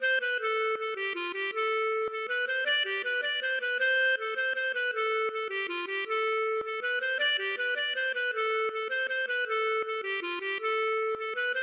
mazurka

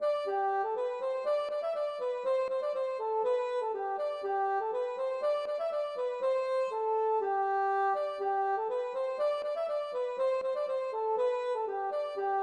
Polka

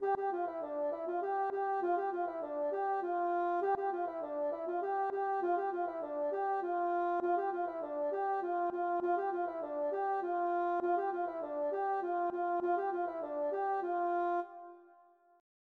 hanterdro; Byrrh

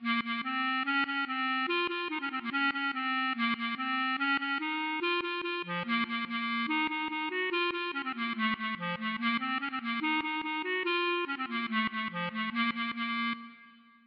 klezmer

